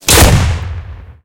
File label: tank hit small; tanks; War Thunder